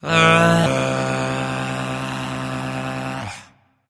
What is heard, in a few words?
sigh; smite; voicepack; janus